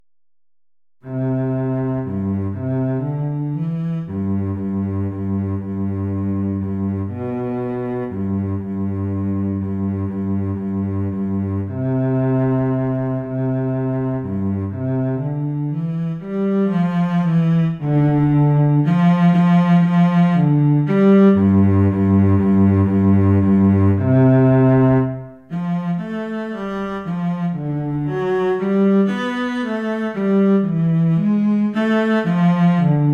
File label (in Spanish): para clarinete violonchelo; danza cortesana